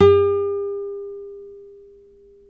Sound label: NYL NV A, nylon no vib guitars; Spectrasonics; Hans Zimmer Guitars VOL-1